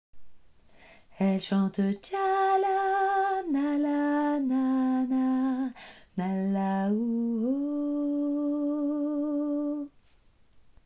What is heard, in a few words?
alto